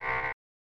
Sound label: Buzzer; Wheel of Fortune Deluxe Edition 1993 snes